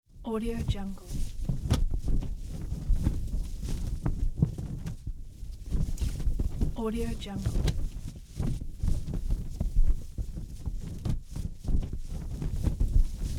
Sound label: flag flapping